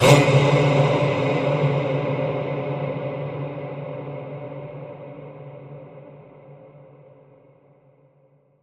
Vocals Dark Choral Ping

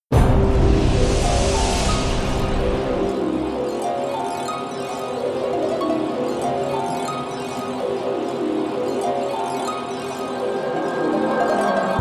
Panic Atmos

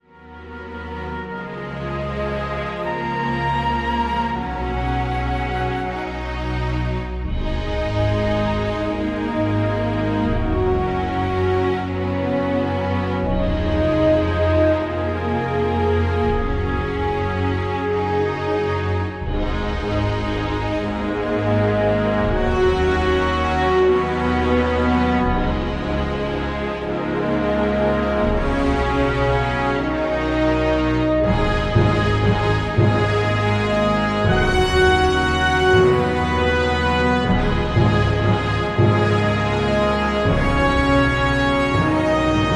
No Choir

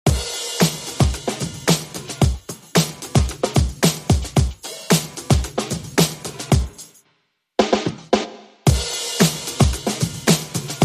Drums and Congas Only

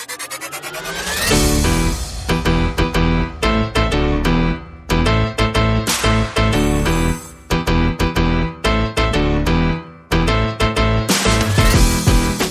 Energized No Synth

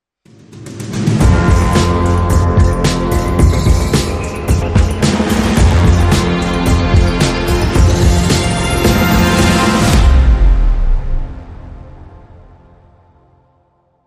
Hybrid Sting

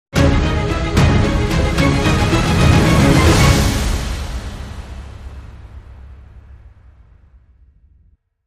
Dramatic Sting

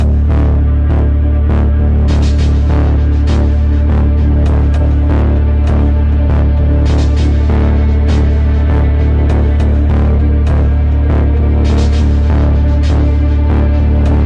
Mounting Pressure

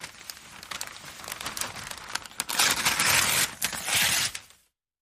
Gift Unwrapping

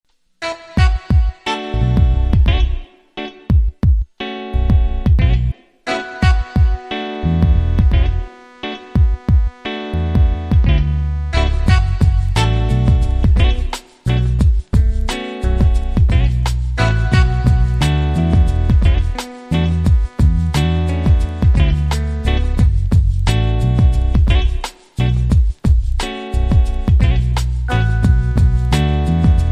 Jazzy

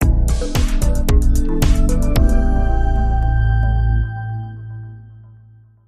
The Trance Sting

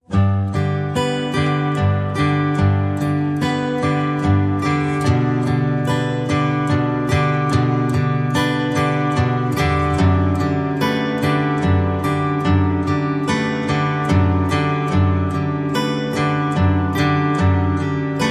No Percussion

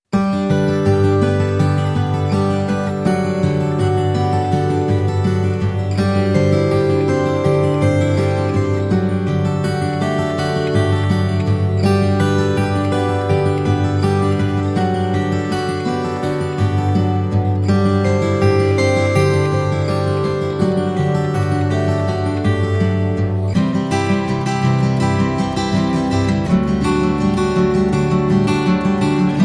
Only Guitars

